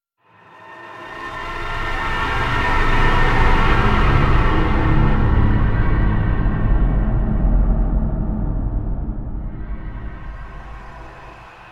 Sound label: Stinger Swell Long